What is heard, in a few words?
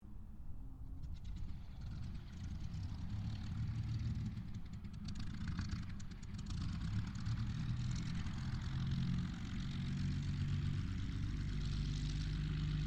AIRCRAFT P-40 KITTYHAWK STARTUP DISTANT STEREO; P-40 Kittyhawk / Warhawk / Tomahawk; Airplanes (Sound Effects:Vehicles)